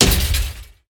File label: Collision Trash Can